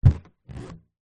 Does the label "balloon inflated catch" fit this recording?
Yes